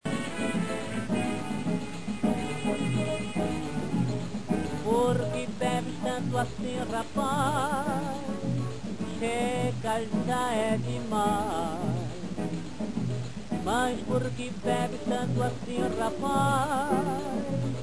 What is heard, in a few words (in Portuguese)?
Riff